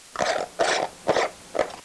masonry trower

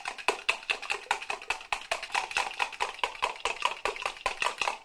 scrambled eggs

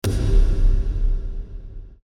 plastic reverb kick

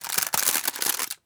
plastic wrapping

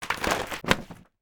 book pages flip

